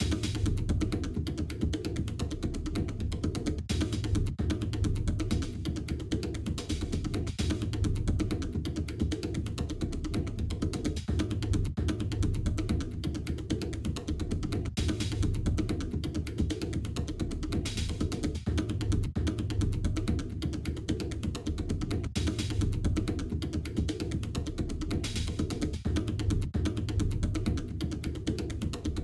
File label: Mus Action Perc Low 130; moons of madness